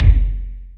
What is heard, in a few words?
boom bass drum sound F# key; 90s; kick drum samples